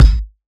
kick old; Drum Sample Pack; One Shots; Slate; SEISMIC

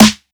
Snare Cluck, 808 Snares; Slate; Vintage Drum Machines Volume 2 808 Edition

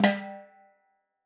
timbales Flams; Raw Perc One Shots; Slate; SD SEISMIC Drum Sample Pack

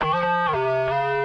phrase Db, Vocal; Slate Digital Omnivox Vocal Sample Pack

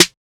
snare blip; one shots; SEISMIC Drum Sample Pack; Slate

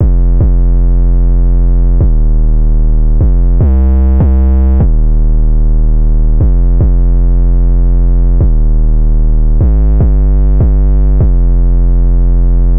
808 loop 150 Fm; Slate Digital BASSHOLE Sample Pack

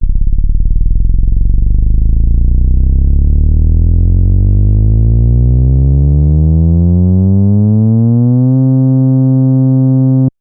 Synth oneshot Slow Glide C, Synth Bass; Slate Digital BASSHOLE Sample Pack

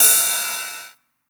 Cymbal, 909; Slate; Vintage Drum Machines Volume 3 909 Edition

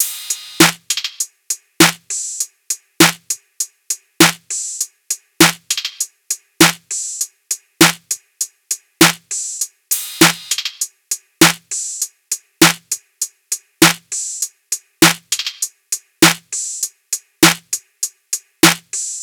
tops 100, Drum Sample Pack Loops Top Loops; SD SEISMIC; Slate

